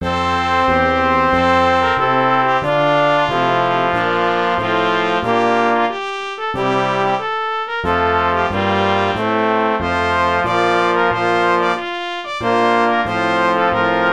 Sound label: Trumpet Tune